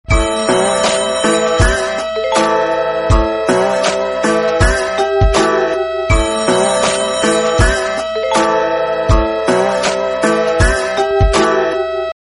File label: blues, tones